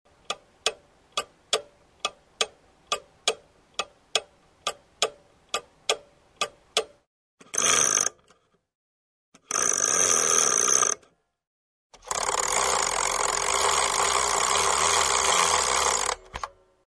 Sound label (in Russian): Zvuk tikaniya nastennyh chasov c maiatnikom potom zavodiat